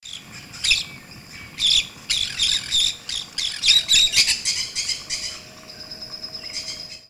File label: Pyrrhura frontalis; PN Itatiaia; Silveira